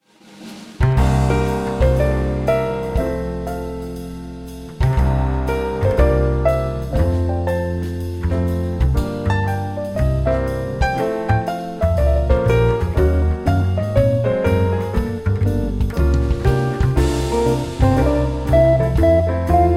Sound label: ocean voyage ringtone